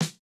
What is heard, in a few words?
Korg snare